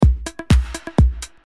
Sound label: Beat